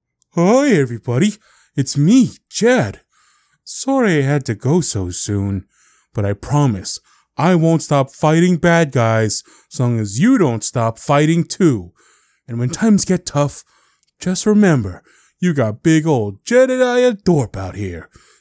Ringtones Notifications; Message from Jed

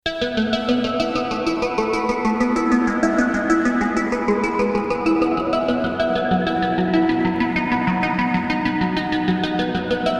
synth noise

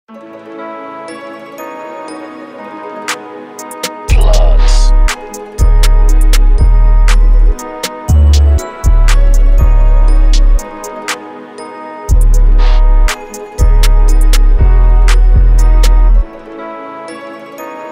Instrumental

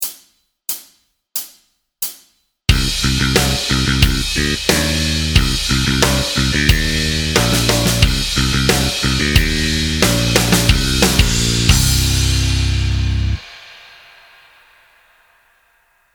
Backing Track

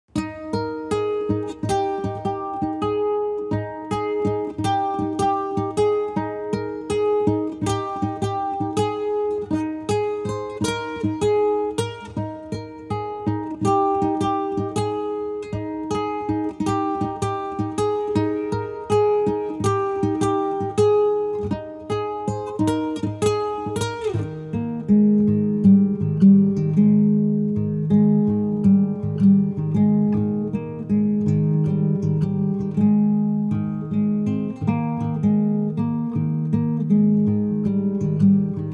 Acoustic Short; Memory

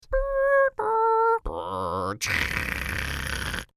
Modem

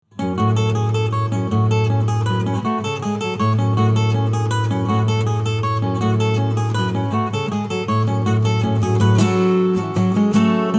acoustic

